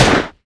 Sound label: GUN